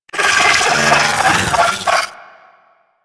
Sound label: Amalgam Moa Satyr Vocal Idle; Warframe